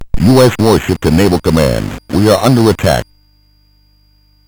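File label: Ocean Ranger, MOS 8580 SID chip music, games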